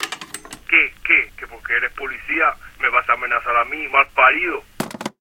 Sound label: answer Phone; narcos